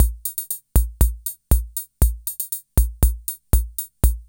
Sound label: ENKA; INIS - Funky Xtreams